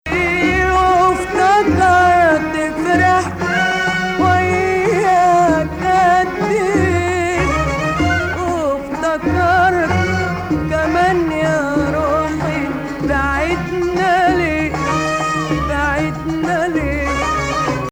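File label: Rast Nahawand, Arabic music; Maqam Rast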